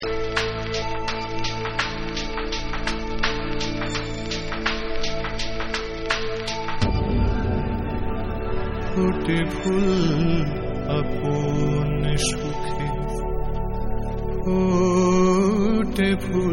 Bengali Song

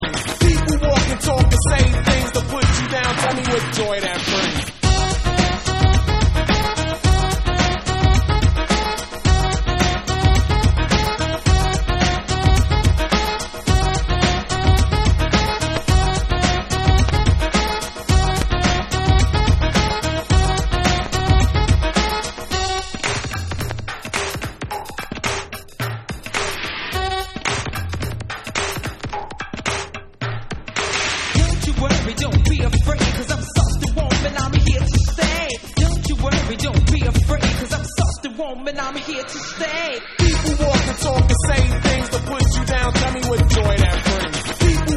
FUNKY

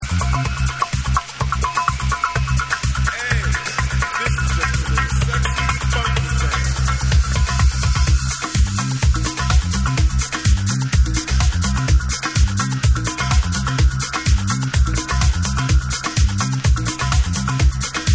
Dub

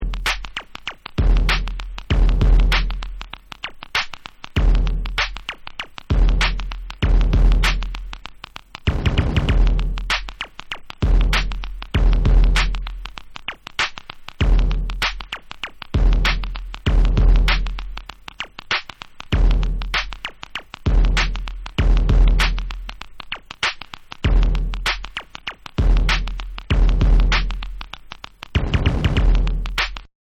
BONUS BEATS

